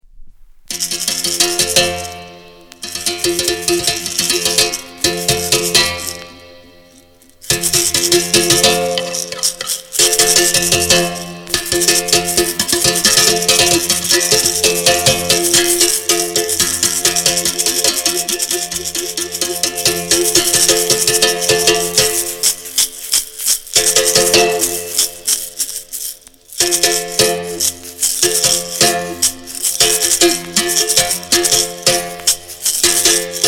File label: BERIMBAU